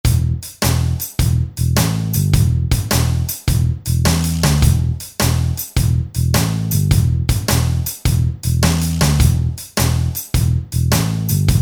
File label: Blues